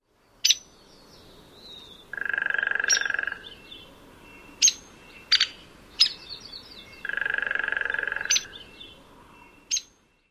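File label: calls and drums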